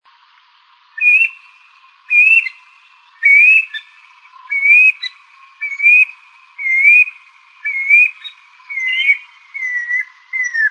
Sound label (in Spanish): Pirinchos uno